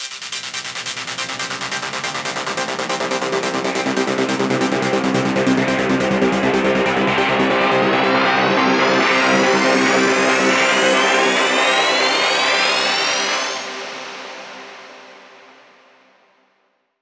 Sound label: 140BPM MaxiKing C, Melody Fills; 8Bars; Vengeance Samples